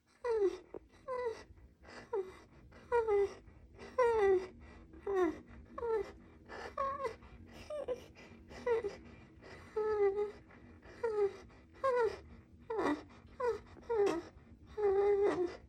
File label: moans